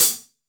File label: CHH, Hi Hats